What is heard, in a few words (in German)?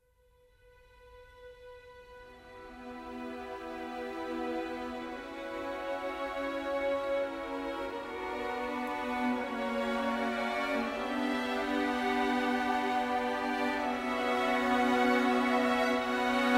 traurige Musik